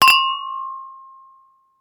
Wine glass Cheers

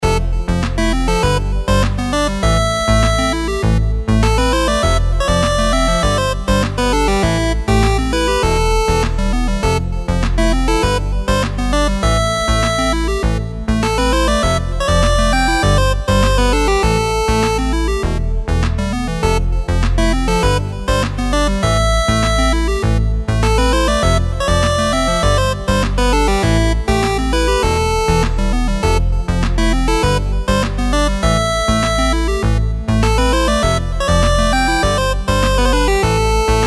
menu loop 100 BPM; アクションゲーム; Action Game Music Pack

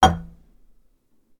Put down the cocktail glass

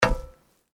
Footsteps Iron plate One

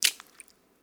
Footsteps Puddle One

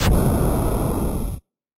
fire magic middle; 魔法/法术的音效

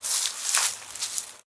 dry leaves footstep; 足音

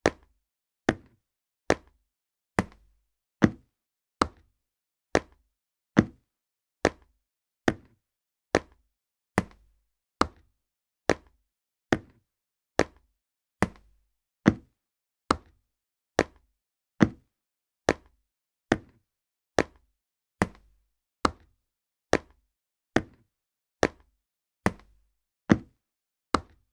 footsteps wooden floor walk; 足音

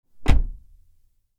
Car Door; 環境音

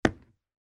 footsteps wooden floor; 足音

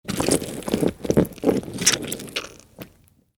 Squeeze a lemon

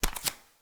Footsteps Barefoot One

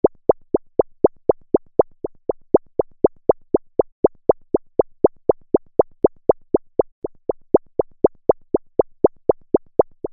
Footsteps Comical Running

